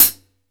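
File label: MIX closed hi hat, 14 INCH OLD HI HAT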